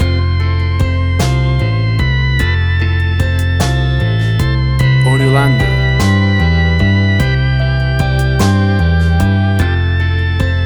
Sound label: 60s Surf Ballad